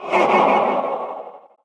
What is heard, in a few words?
might